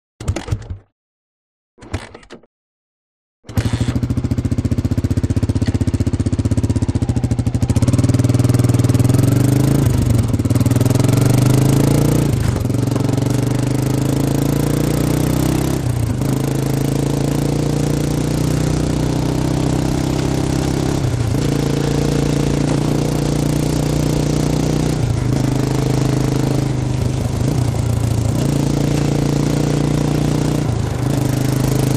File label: Motorcycle; Constant; Honda Trials Bike Start Up And Away Riding On, Finally Slows, Stops, Idles And Switch Off.